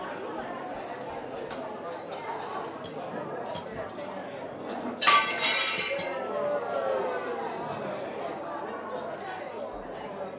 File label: Dropped plate